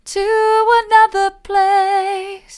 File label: to another place mid, vocal